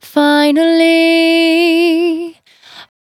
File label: Finally 70bpm C, Velvet Vocal; Zero-G